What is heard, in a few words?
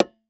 Impact Tire Rubber Hit By Plastic Tube; Impacts